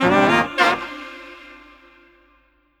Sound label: SAX, Drum & Bass, 150 BPM; Ueberschall; Drum & Bass Resonance